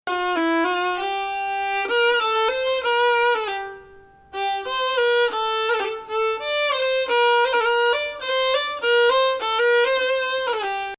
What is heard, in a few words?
Rast, maqam